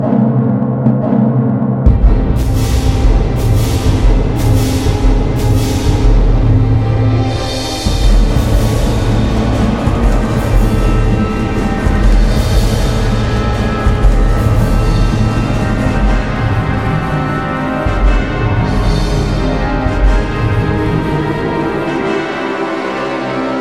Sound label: Orchestra Version